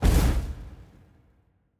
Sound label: kaiju superweapon fire burst; Stellaris; biogenesis; ships